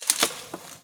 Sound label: ball hit leaves; golf; ProStroke Golf World Tour 2007